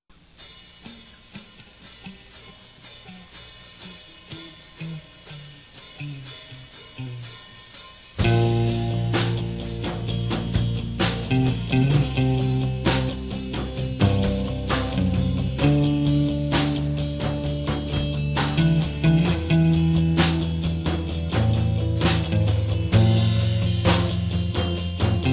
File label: instrumental; Bootleg